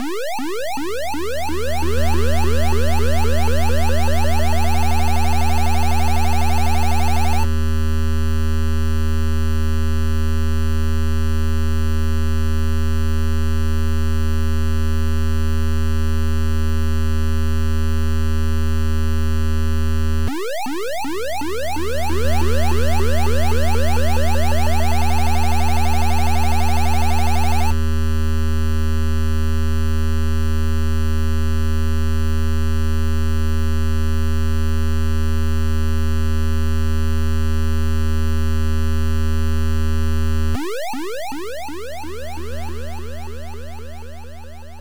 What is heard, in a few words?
Warp; Feedback; msx2 psg